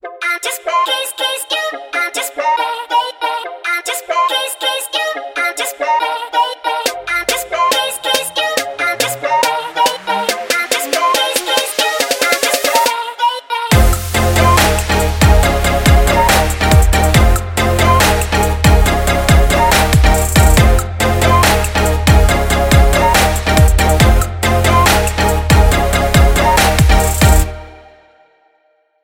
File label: Kit Full Loop